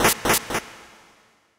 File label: Door exit; Pokemon Solar Light & Lunar Dark 1.0.1